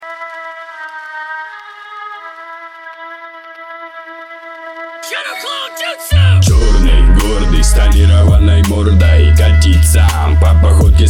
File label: REMIX BASS